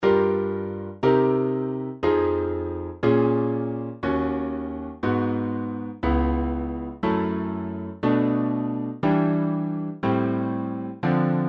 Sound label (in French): cycle des quartes en mineur